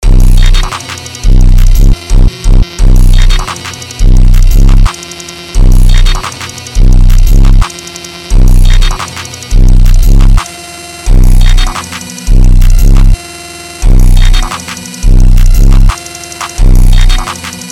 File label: car instrumental